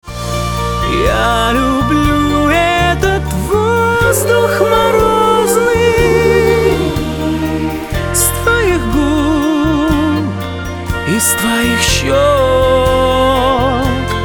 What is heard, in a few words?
viol